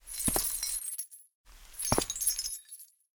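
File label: fetter footsteps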